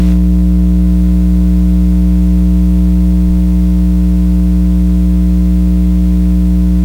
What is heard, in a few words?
Mixer Hum